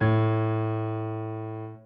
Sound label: soft slider tick; osu!